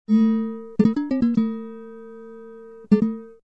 guitar

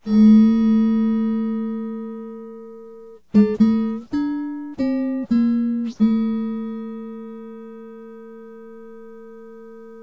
guitar

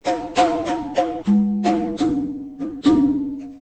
conga

